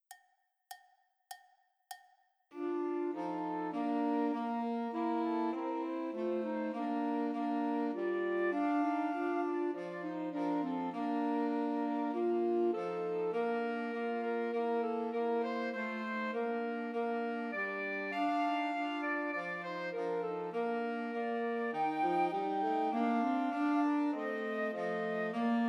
Once in Royal Davids City, Christmas Trios for Flute Alto Sax and Tenor Sax